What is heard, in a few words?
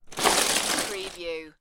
Lego, rummaging in lego box